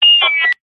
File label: cb radio feedback short burst